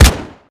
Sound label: Deagle